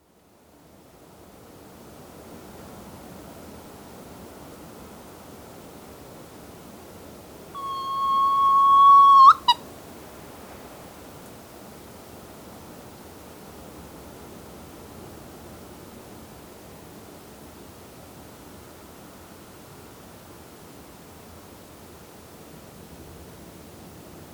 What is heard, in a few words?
Soft plumaged Petrel Pterodroma mollis